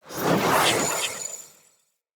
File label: Staff Caster Proj Whizz By; Weapons; Player; Staves; Starter Staff Caster